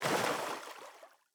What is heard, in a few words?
footstep water land; Rust